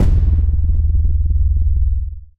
giant footstep

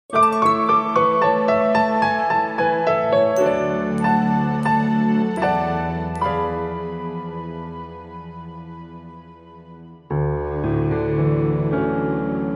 Piano A

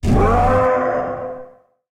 monster

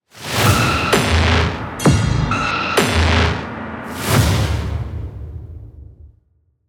Trailer Jingle BPM130

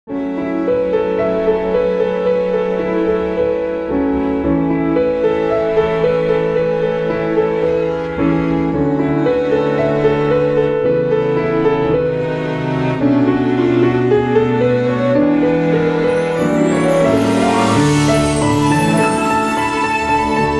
Piano A; 4/4; Piano 7

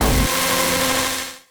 attack

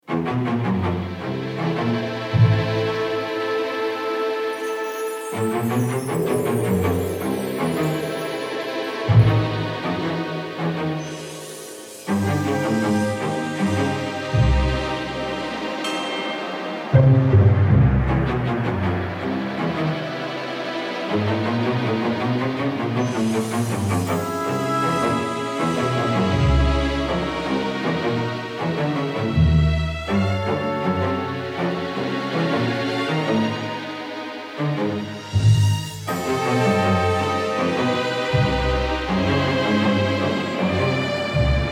Dungeon BPM80 Am

